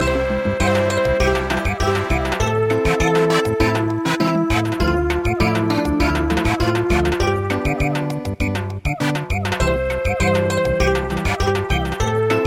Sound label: Samba; Bunny